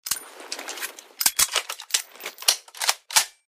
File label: reload; ZombieBattle